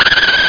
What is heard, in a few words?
Tire Squeal; CephaloTron